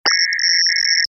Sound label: button activation